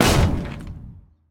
impact tank

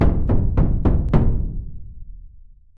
round start

